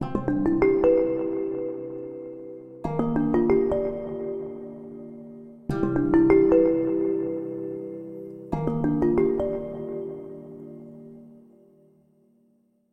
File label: ubuntu touch glass marimba